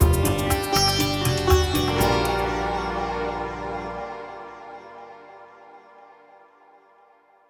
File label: lose stinger; Saraswati